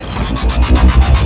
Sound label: Power Up